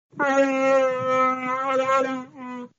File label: ahrooooogaha